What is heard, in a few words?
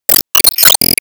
Gimmick Break; disney star smash android ios mobile gamerip 2020